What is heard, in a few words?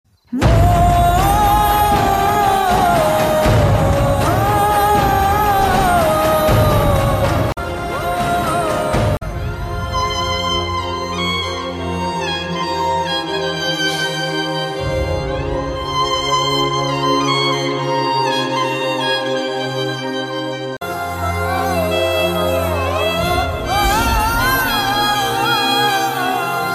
Emotional Bgm Ringtone; Ugram; Kannada